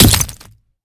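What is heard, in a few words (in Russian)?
разбившаяся керамическая ваза от удара молотком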